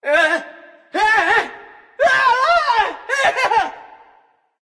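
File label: non verbal fear, voice; cernunnos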